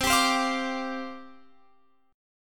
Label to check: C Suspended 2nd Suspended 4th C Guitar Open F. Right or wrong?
Right